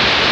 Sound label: walkie noise; DeadFright